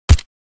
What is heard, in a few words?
Spec ops assault rifle single shot; war